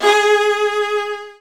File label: Virtuoso Strings